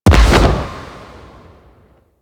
Void Comet Appear; Warframe; Void Storm; Railjack

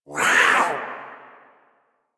Feral Vocal Attack Short; Warframe; Catbrow

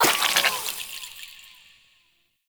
Poison Shroom Spore Cast; Warframe; Nokko; Gyromitra

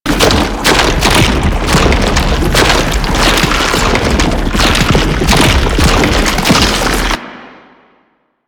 Hand Bomber Fire Loop; Warframe; Man In The Wall

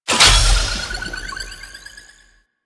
Survivors Complete; Warframe; Infested Eris; Encounters

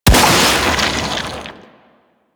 Inf Sniper Rifle Shot, Infested; Warframe

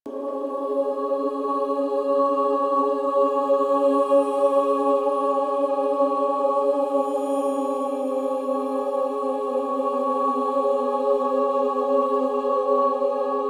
Caves Amb Pads; Dynamic Music

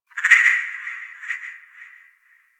forest distant frog call; Ambience; Warframe; Grineer Forest

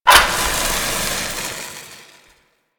Proto Infested Maggot Spark Start

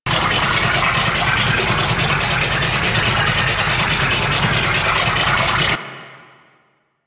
Energy Room Machine Loop, Ship; Ambience; Props; Warframe; Corpus Ship Remaster

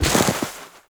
Step Snow, Footsteps

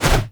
Whoosh, Unarmed; Weapons; Enemy; Mockery Husk

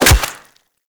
Melee Staff Impact Flesh; Staffs

